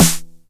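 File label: 909 SD Tape, snare drum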